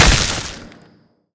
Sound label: freeze